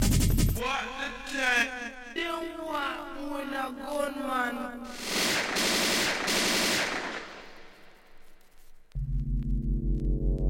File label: remix